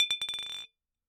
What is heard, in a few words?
Bottle Struck